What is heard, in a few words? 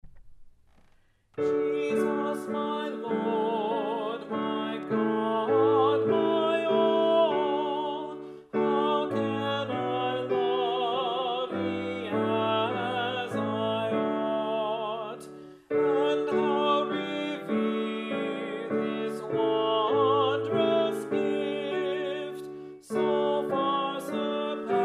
Alto; Jesus, My Lord, My God, My All